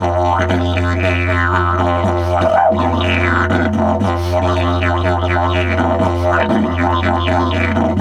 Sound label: 120, Didgeridoo